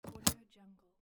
large lever arch folder mechanism; stormwaveaudio

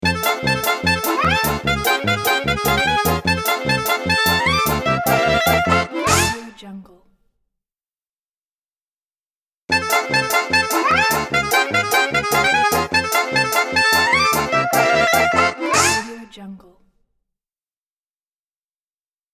polka party logo

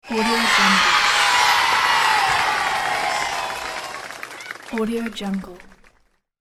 kids cheer

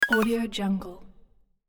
noisy arp down tone

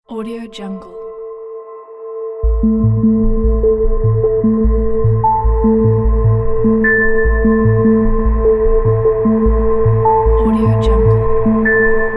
gentle minimal logo

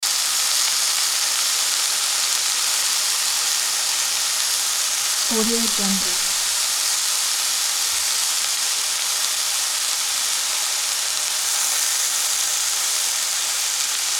sizzle

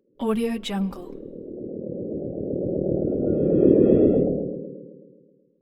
space ship alien ufo pass by; hollywoodedge

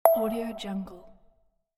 notification

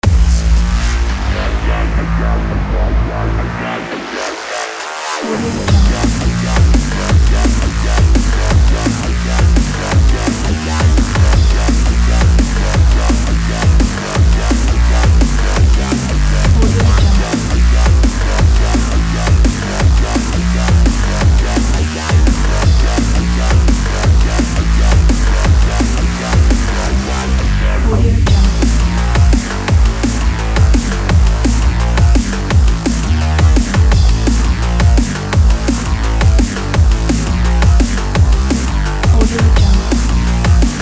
race

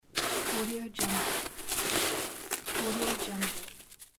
snow digging

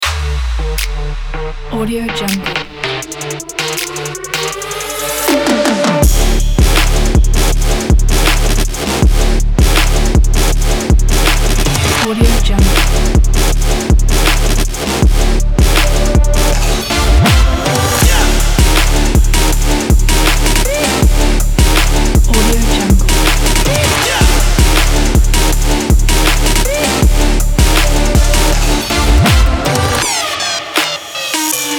sport is the trap

